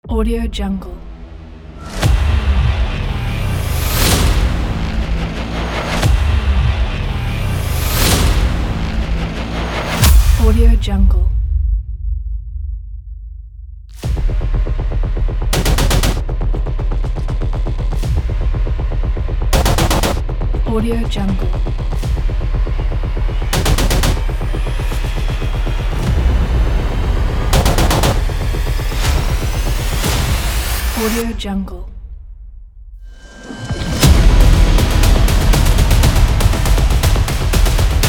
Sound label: horror trailer